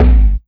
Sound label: Ragga & Dub Reggae PERC; USB Soundscan vol.27; AKAI